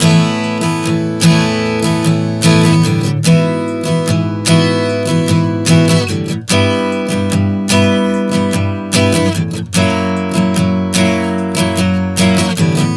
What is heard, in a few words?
Progression 074 Asus9-E-Em-F#7, Acoustic Guitar, Chord Progressions 074 bpm; Sonic Foundry; Songwriter Acoustic Guitar Companion